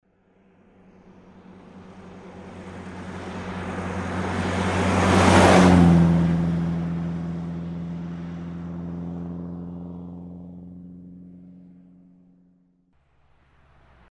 dlinnyj podezd i dlinnyj otezd

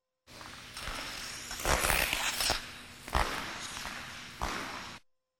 probeg mimo; Звуки катания на коньках

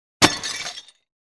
tarelka

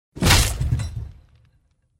mochny udar

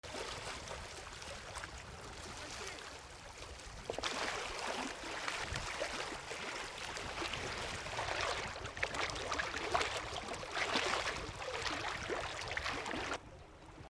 Plesk v kamniah

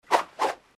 vzmahi nakrest

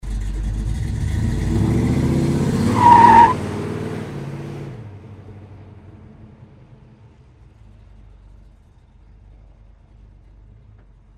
rezkij start otezd holotoj hod i eshhe rezkij start

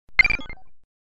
jelektronnyj zvuk; Короткие звуки для монтажа — для transition, движения, смены кадра, звуки для выхода эффек

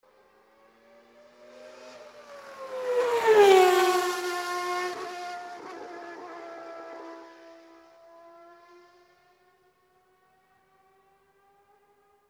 bystryj proezd blizko k mikrofonu

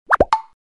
s otskokom

